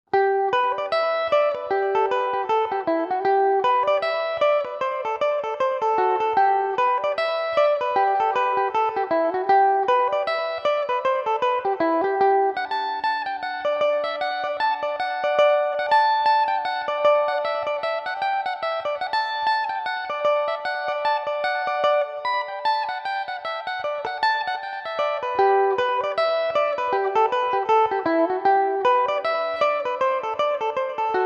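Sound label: G major belmuse, irish mandolin; The Hawthorn Bush; Belmuse EM-190 electric mandolin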